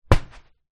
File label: household bean bag foot stool drop on hard floor